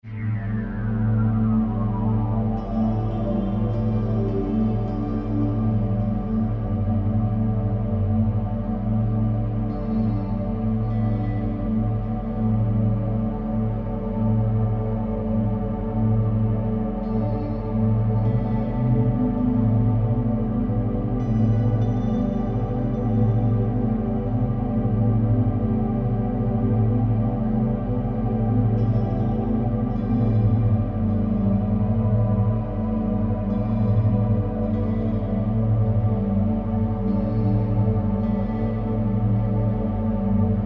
Amb Sound Scape Ethnic Bazouki echoes, Deep Ambience; Ambient SoundScapes